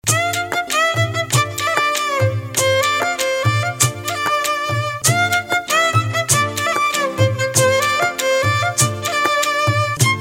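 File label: beautiful national Indian music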